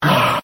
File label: breath exhale; ooh ahh